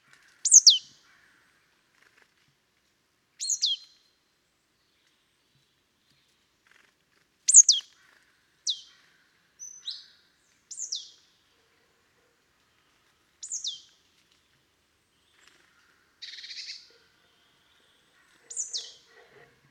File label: Poecile palustris; SETE